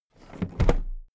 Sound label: toolbox put down heavy